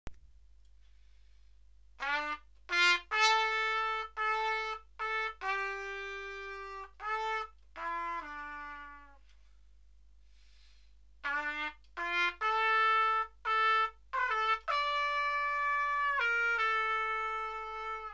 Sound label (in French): Trompette